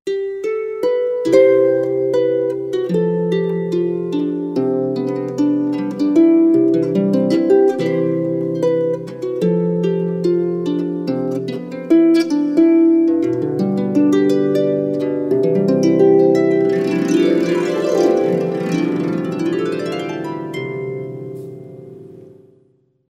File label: Wedding March Recessional, Harp